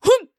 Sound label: Cloth Combat Jump